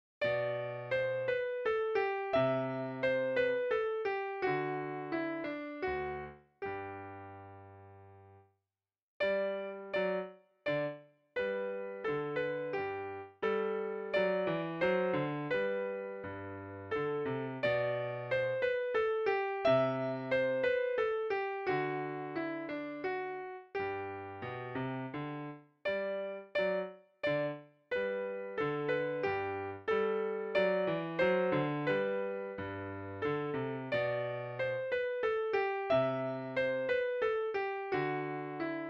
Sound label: Minuet